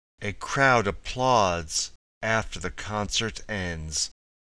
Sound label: crowd